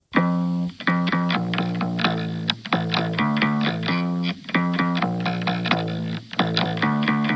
evil bass

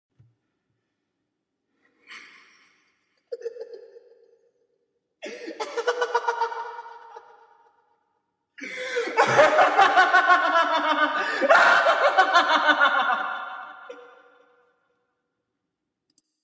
laugh house of horror

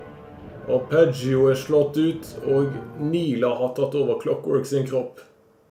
arpeggio

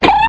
cartoon pluck dagger

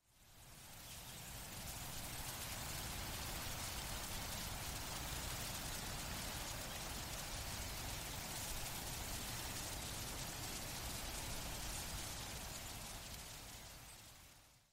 mid winds sounds

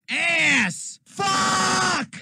yells; avgn

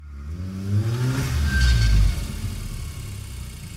car pull up

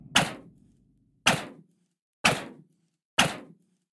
punch; ultrakill

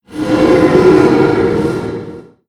zinogre overcharge distant

